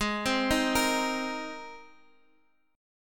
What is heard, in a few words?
G sharp Major Add 9th, Guitar Half Step Down; G#add9